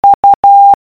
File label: U morse code